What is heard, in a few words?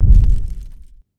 garg far step; slender